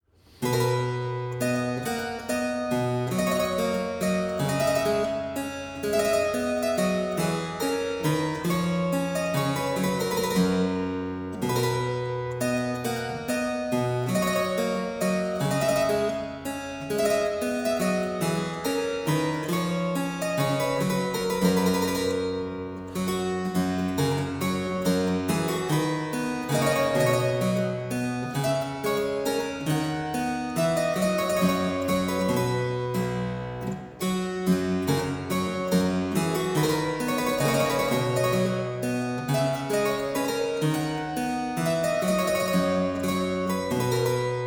Songtune, harpsichord; Complete Chamber Music; Brilliant Classics; Miscellaneous pieces for the harpsichord

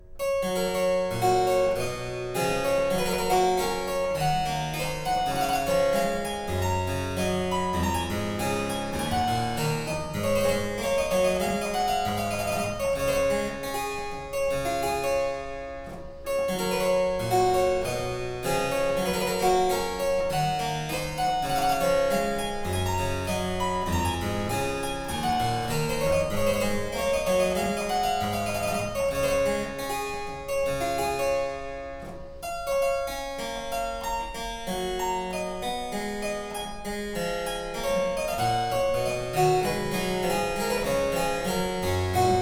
Suite In G Major, Almand, Harpsichord Suites; Purcell edition

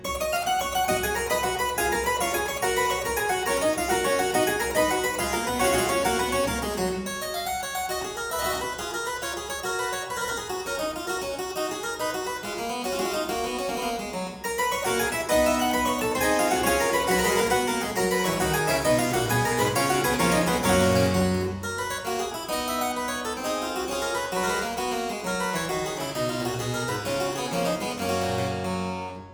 Aria in G major Partita 23, Harpsichord

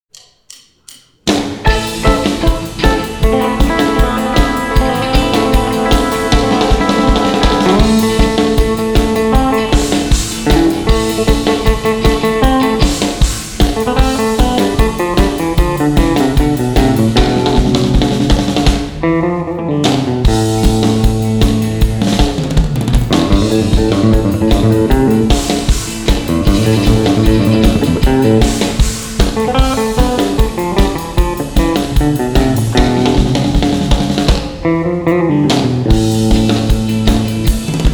[Minus Bass Guitar]

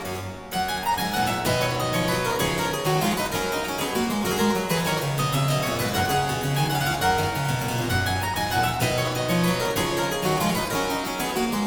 Aria Variations in G Major, Harpsichord; La Capricciosa; BuxWV 250; Buxtehude; Opera Omnia; Harpsichord Works

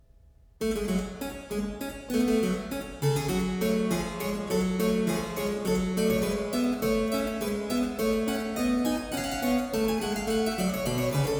Air, harpsichord; Complete Chamber Music; Brilliant Classics; Miscellaneous pieces for the harpsichord

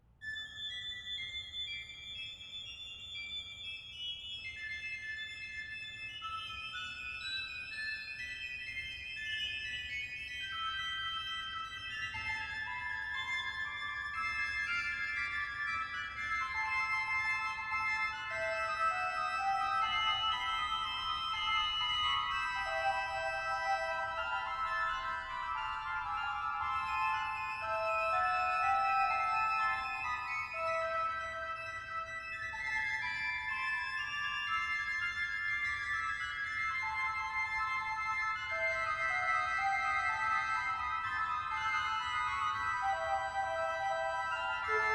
Canzonetta in A minor, Organ; Buxtehude; Complete Organ Music

